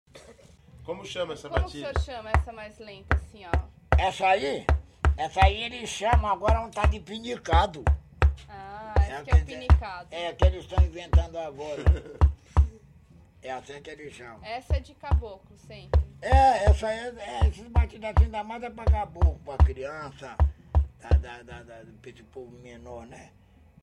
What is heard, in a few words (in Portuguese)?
batidas